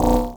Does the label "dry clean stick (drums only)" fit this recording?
No